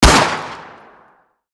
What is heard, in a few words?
Nambu, Guns Fire; Weapons